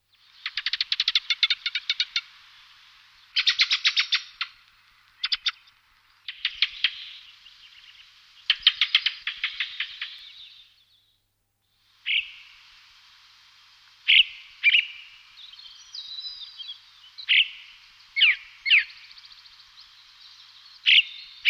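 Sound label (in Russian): belozobyy drozd golos, ring ouzel voice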